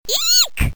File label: eek